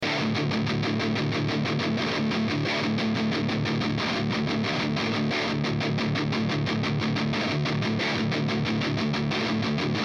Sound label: DiMarzio Evo F JCM800